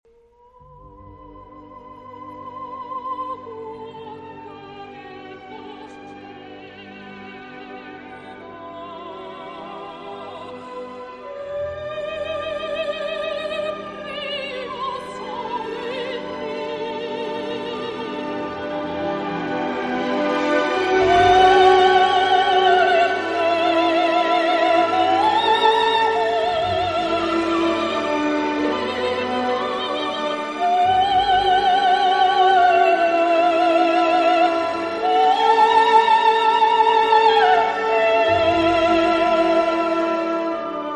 soprano

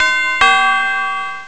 Door Bell

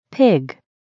pig

